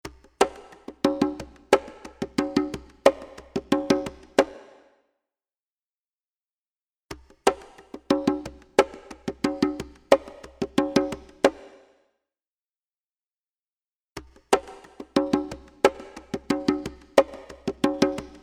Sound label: congas wet variations